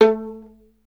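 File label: STR violin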